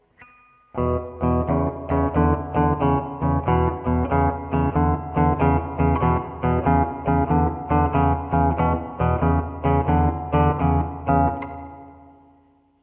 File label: rhythm rock